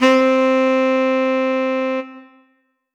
Roland D-20 Sax C5

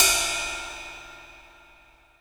Ride Cymbal

